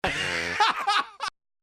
Crazy Phlegm Laugh

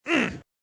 grunt

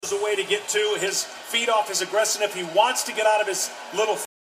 aggress sniff

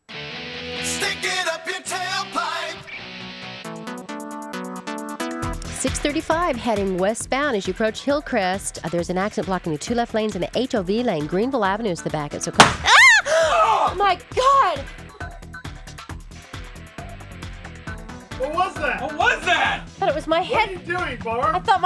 firecracker scream

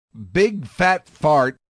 big fat fart

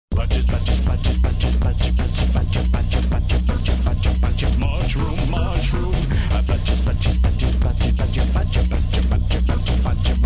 Badger song; BaD Radio

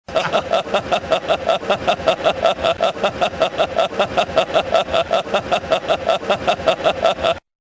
laugh

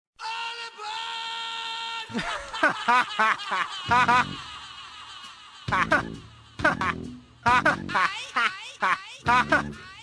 laugh

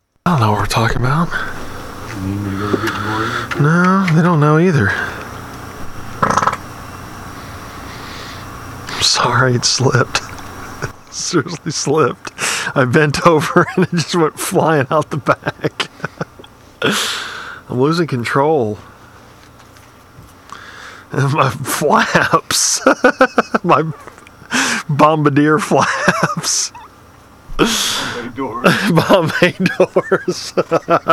farts

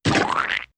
splooge sfx

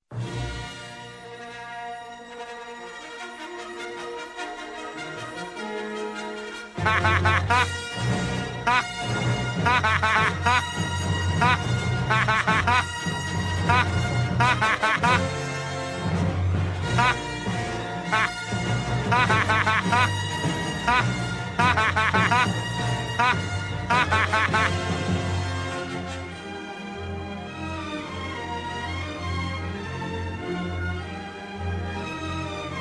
laugh

